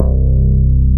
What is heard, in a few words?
BAS MKS-50; Best Service Gigapack 2